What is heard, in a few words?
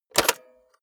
cassette player slide tray take out hard